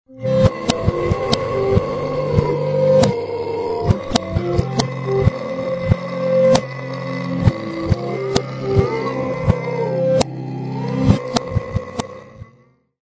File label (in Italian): assolo